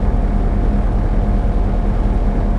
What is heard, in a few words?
trash